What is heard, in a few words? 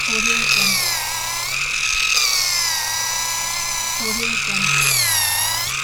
female epilator loop